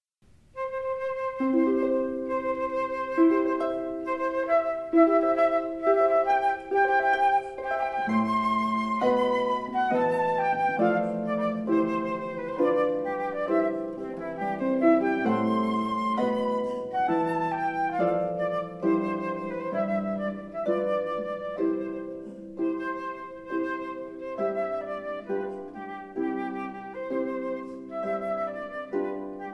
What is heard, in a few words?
with harp, Flute; Wedding March